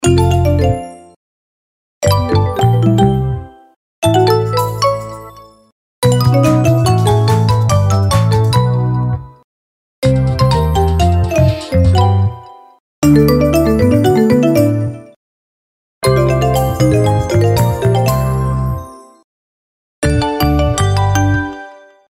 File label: win sounds; EGT; 27 Wins